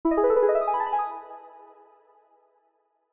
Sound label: tone; Valentines; seasonal